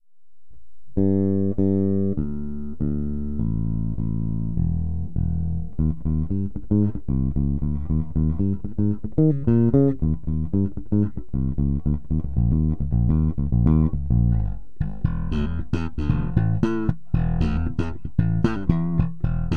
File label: FENDER 7150L Pure Nickel